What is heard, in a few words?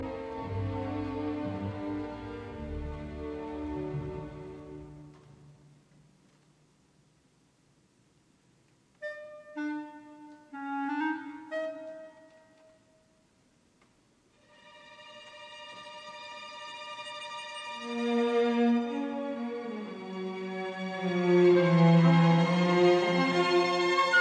Symphony in B flat major, Finale Adagio Allegro moderato; Symphony No 5; WAB 105